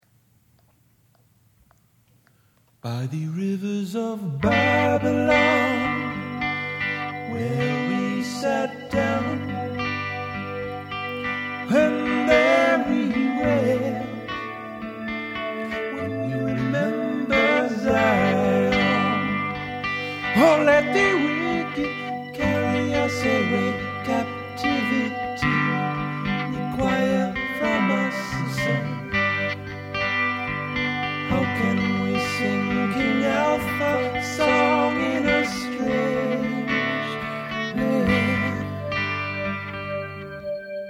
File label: rivers w organ